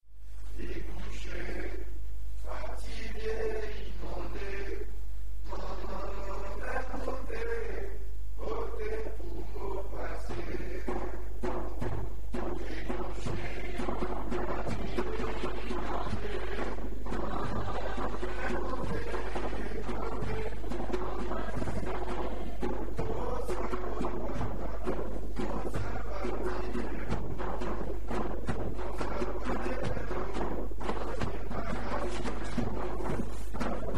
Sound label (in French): krik bouche, choeur mixte